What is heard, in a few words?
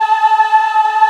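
A4 men women ah, Choir; USB Soundscan vol.28; Acoustic & Synth; AKAI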